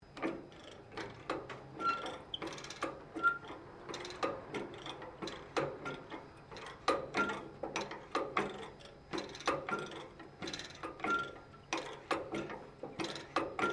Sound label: PETROL BOWSER CHARIOT TYPE OPERATING LONG, Various old or antique devices; Machinery Sound Effects; Machinery (Sound Effects:Machines)